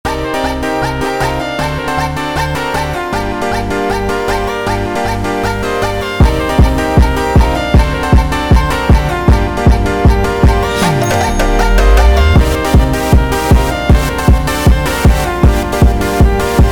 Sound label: Montagem super Sped Up Reverb